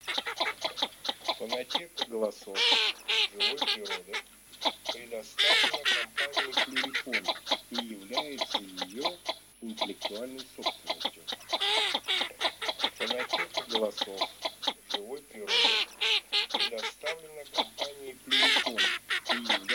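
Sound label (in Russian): Широконоска Mestolone, манок; plurifon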